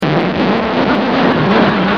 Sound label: scratch